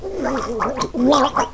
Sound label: drown; quake2; buttercup